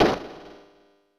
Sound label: Box Crate Hit; Super Smash Bros